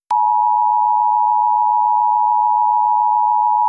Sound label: bip